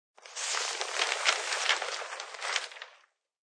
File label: Nws Ppr Trn, Newspaper page turn and rustling; Crackling; Objects